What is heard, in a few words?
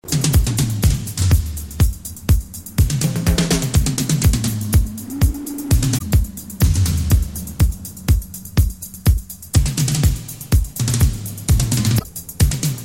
Sweet drums